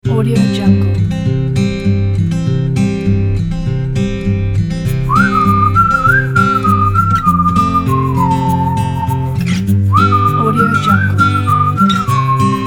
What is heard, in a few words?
cute indie folk; soundunboundstudio